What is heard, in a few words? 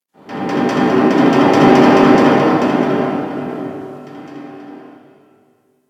Infested Distant Metal Groan; Warframe; Corpus Infested